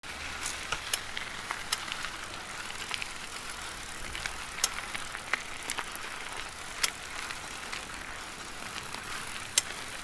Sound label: asmr